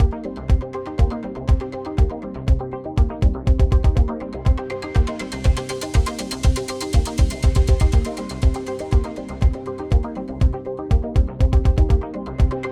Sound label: Jingle End